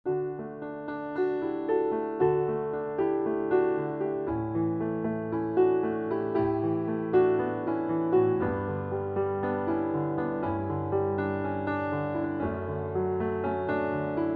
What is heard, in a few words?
Rendezvous in the Rain, piano